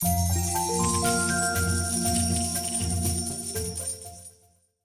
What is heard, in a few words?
pot; Lucky Fortune; Wazdan